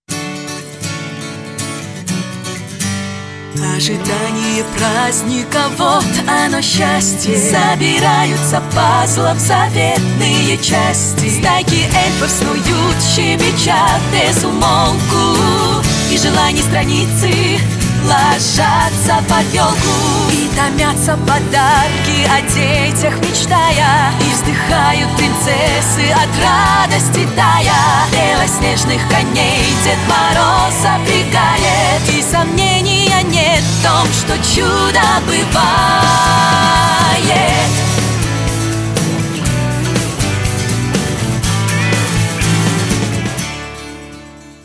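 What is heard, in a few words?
vocal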